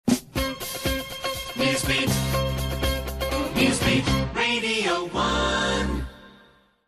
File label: newsbeat